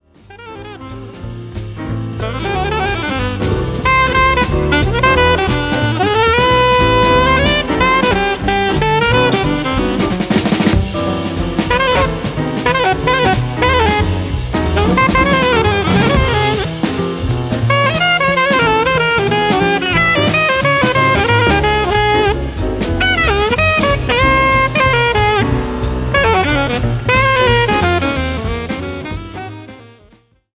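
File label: jazz sax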